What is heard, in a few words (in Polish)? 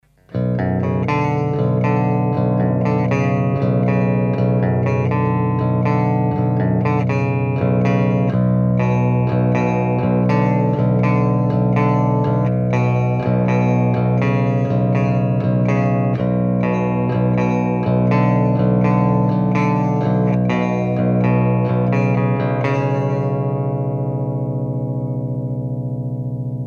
schecter czysty, 7string